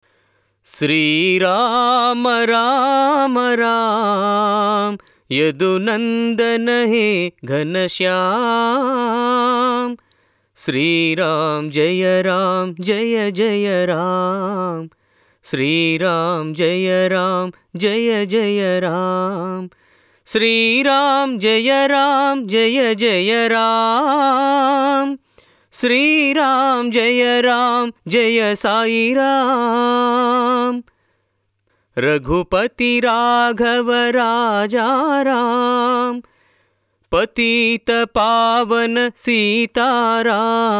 tune; Sri Rama Rama Raam

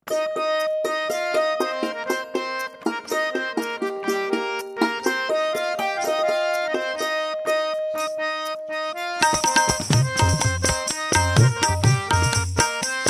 Ladies Karaoke D Sharp Pancham

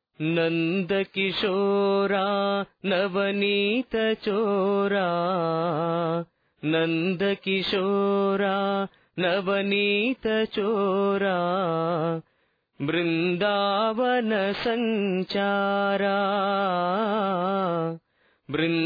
TUNE; NANDA KISHORA

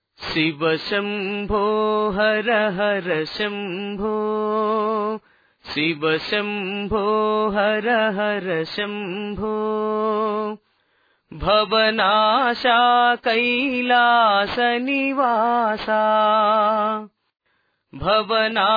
SHIVA SHAMBO TUNE

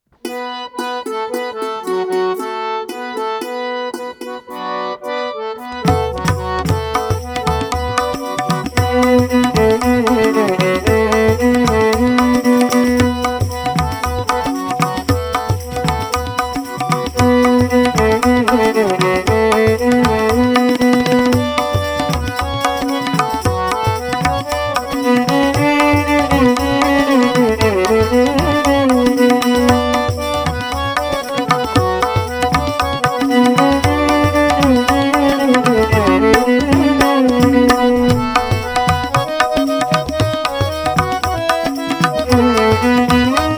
Ladies Karaoke B Pancham

